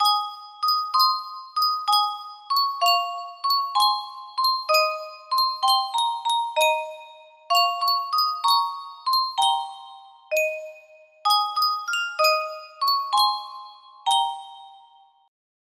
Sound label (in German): Yunsheng Spieluhr, music box melody